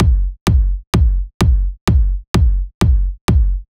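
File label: Drum Loop 128BPM bass drum; VEC5 Drumloops; VEC5 Multi Loops 128BPM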